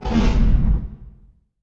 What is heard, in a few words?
IMP FLAME, Cinematic Impact